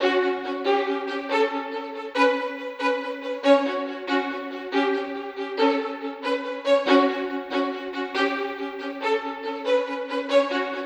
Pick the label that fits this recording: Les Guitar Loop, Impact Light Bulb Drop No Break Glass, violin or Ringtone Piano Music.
violin